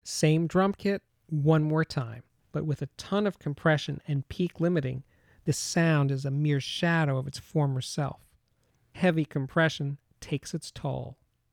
Drum Kit Dynamic Range Heavily Compressed Announcement; The Ultimate Headphone Demonstration Disc ( 24Bit192kHz ); Chesky Record